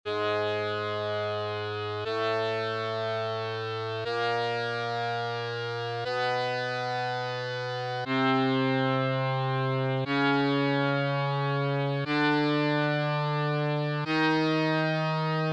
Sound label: G sharp scale, harmonium; keyboards